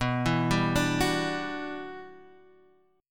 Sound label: E Minor 9th B